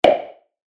足音 コンクリート 単音 一歩目; モノラル

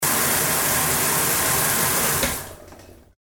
シャワー・お湯を止める音; 日常生活の効果音

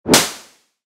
ひっぱたく ビンタ 叩く; 武器効果音

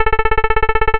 会話; nes retro talk sound effects

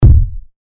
こん棒が当たる; モノラル

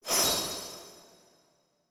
トランジション シャキン

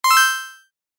アイテム入手 レトロ

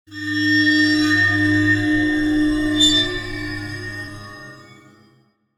怖い金属の音

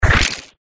グキッ！ギックリ腰のような音

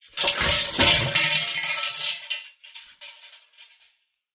本坪鈴

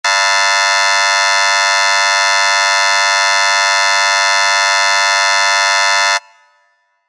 劇場やホールの開演ブザー 長め リバーブ有り; 戏剧音效

